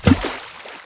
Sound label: Splash